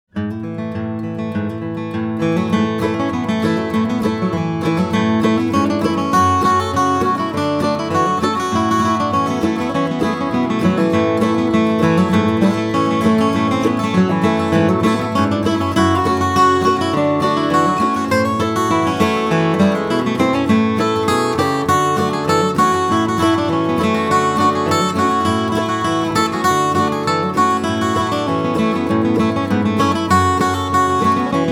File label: Guitar; Cherokee Shuffle